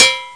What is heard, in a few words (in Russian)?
koubel odinochnij chugunnij